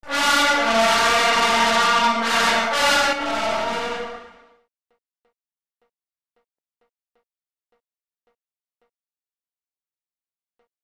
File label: fanfare short